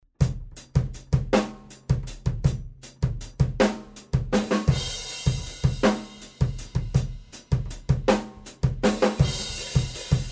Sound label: Room Mics RN17